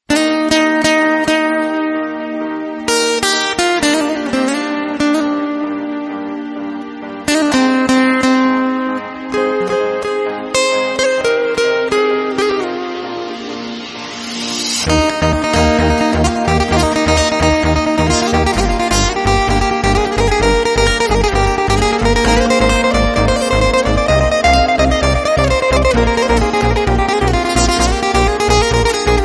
instrumental

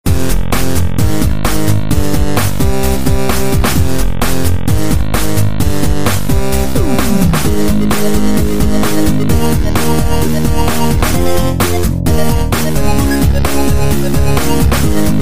techno theme; avgn

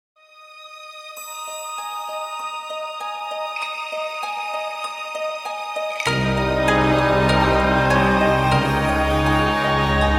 villages; okami; kamiki

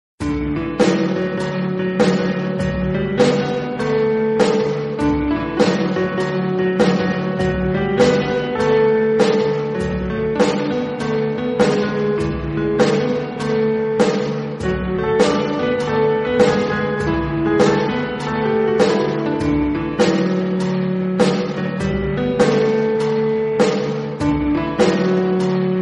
instrumental

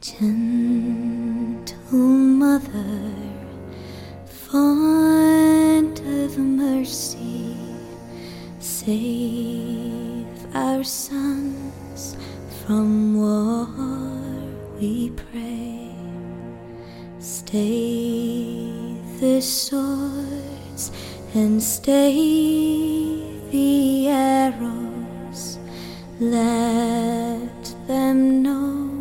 hymn

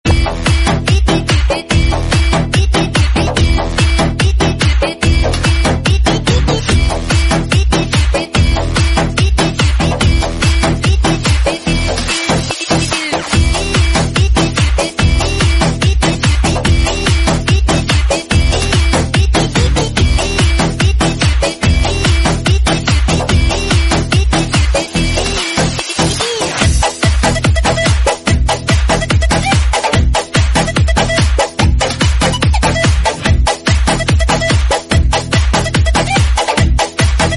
mix ring ringtone

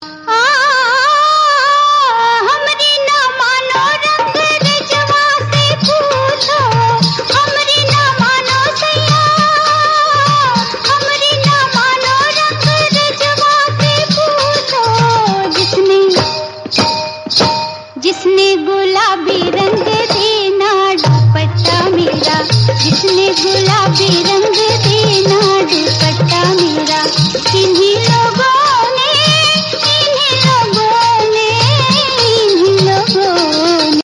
bollywood old

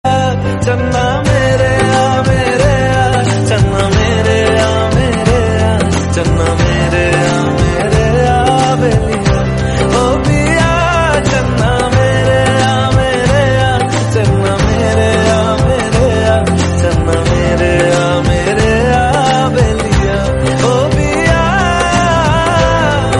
ring ton

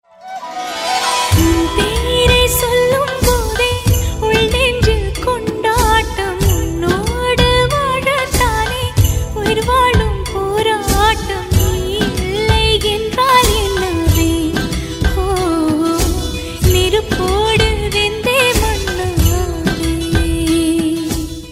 female

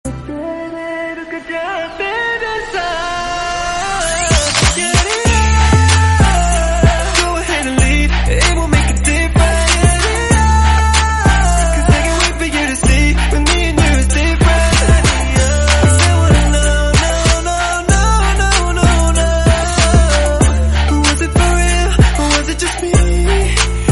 english mix

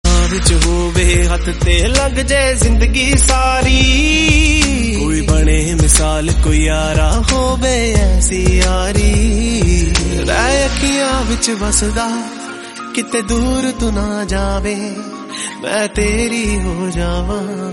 bollywood song new

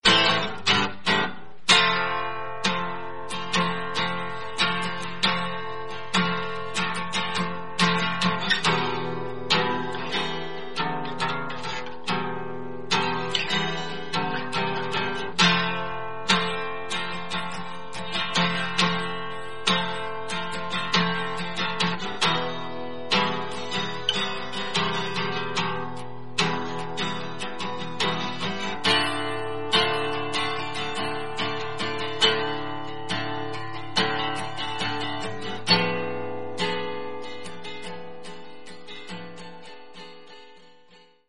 romantic

